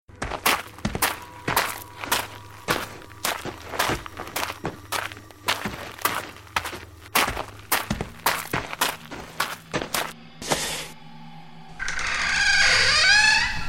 Haunted House

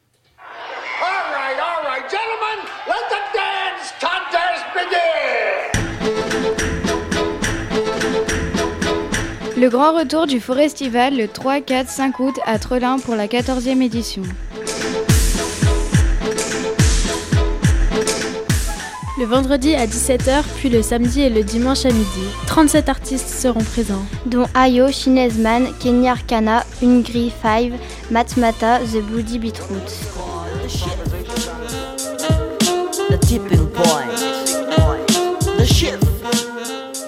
jingle; mjc tilleul; Foreztival

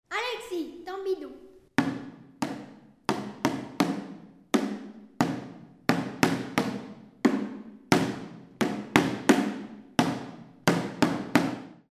tambidon

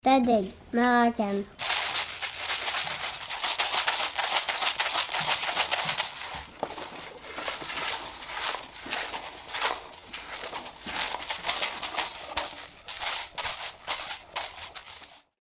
maracame

